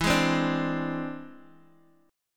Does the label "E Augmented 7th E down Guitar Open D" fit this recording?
Yes